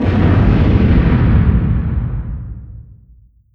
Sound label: distance ship hit big effect; Hearts of Iron IV Death or Dishonor